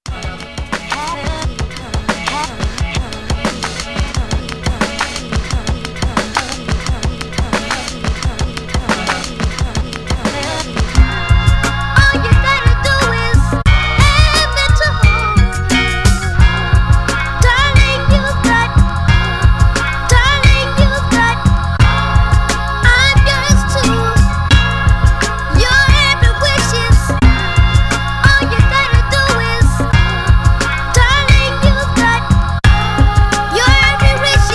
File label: Instrumental